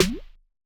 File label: 808 jump snare; nova sound